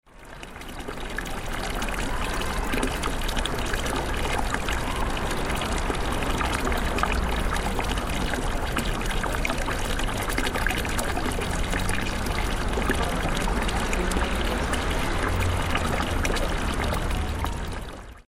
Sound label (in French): Saint Nizier